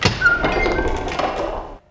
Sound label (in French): porte